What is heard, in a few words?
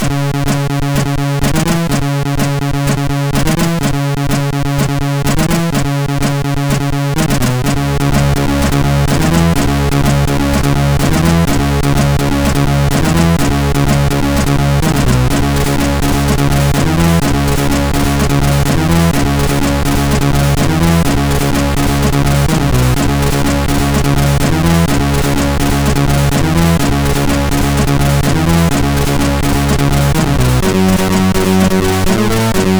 sid, MOS6581R4; Touch Stone intro